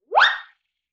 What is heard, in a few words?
Wind Whistle Zip; Vintage Cartoon; Musical Elements